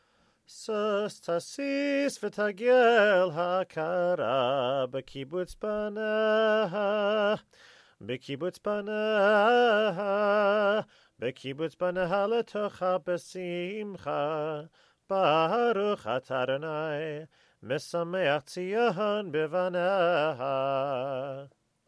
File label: wedding blessing fancier